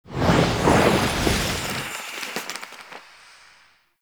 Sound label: Movement Whoosh; Enemy; Mendicant; Mnd Wazard